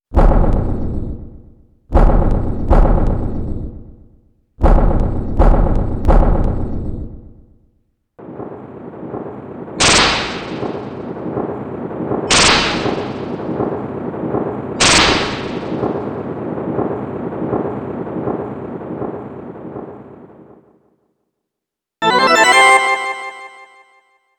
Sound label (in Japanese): 8bit, チップチューン